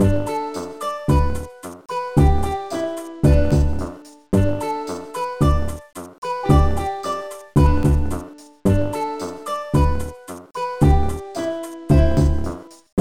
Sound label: Music Play; my animal centre baby animals 2009 nds gamerip